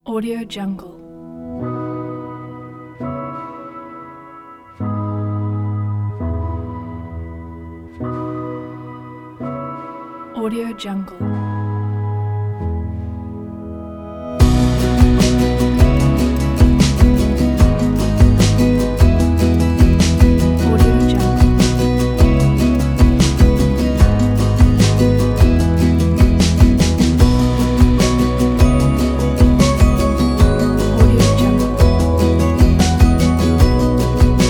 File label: acoustic folk